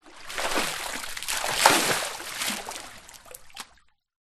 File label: nature water movement surface splashes deep; zapsplat